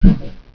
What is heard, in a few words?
throw; asciisec